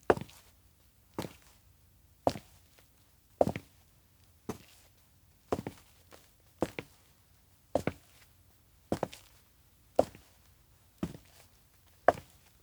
steps outdoor observatory walk; awayuki; anemoi

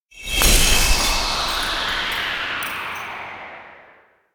elec screech high; anemoi

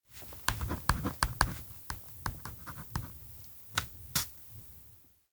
roster write name; anemoi

